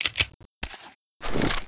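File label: crossbow reload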